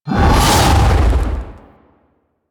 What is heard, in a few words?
Golem Melee Swing; Warframe; Attacks